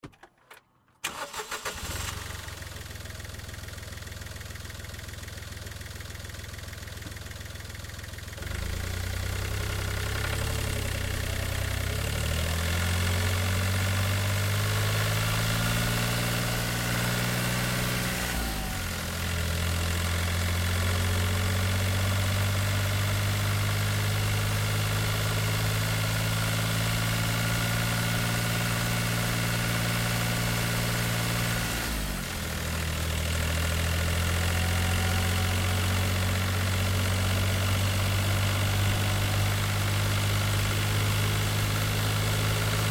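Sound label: Car Smart Car Onboard Drive Slow; 2005 Smartcar Fortwo; Vehicles; BigRoomSound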